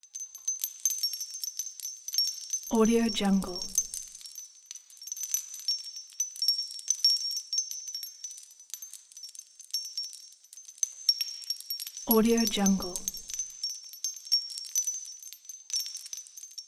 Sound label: crystal twinkling